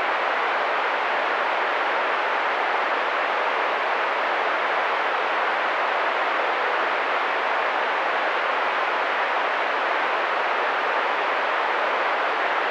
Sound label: 500-2.5KHZ PINK; 20dBFS